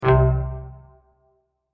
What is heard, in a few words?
buzzer